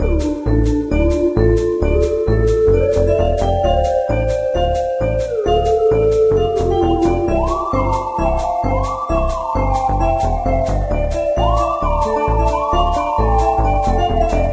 Spaceship Music; Diner Dash: Flo on the Go; NDS

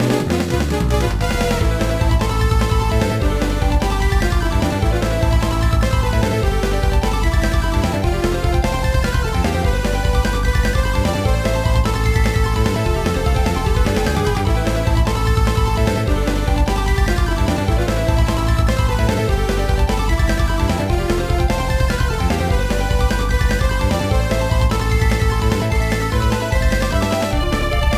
Suspense; Golden Sun

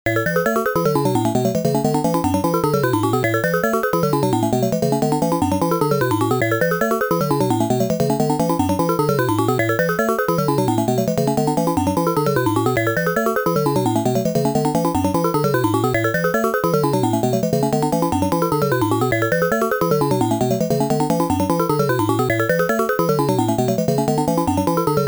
Rescue Music; galaga

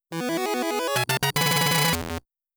New Record Fanfare

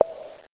Sound Click; Learn to Fly 3

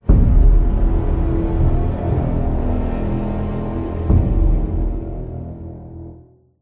Sting Tense; Game of Thrones: A Telltale Games Series

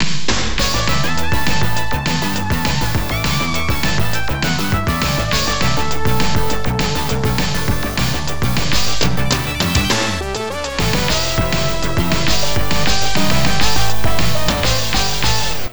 Pro Baseball News Jingle; Namachuukei 68; x68000

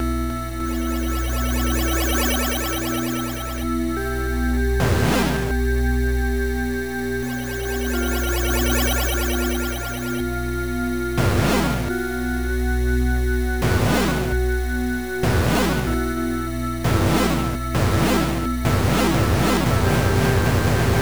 Demon Fusion With SE; Shin Megami Tensei; PC Engine Super CD-ROM2

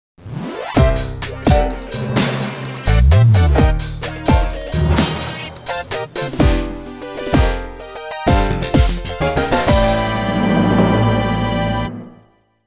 Course Overview Fanfare; Mario Kart Wii

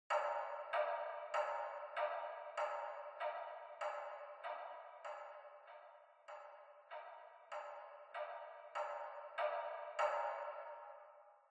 Clock Interior

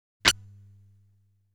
Damage Sound

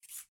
critter rat noise; 12 is Better Than 6; The Apostles; Windows

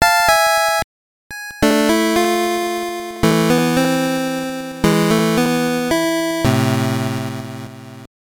Death; Ultima Runes of Virtue; Gameboy

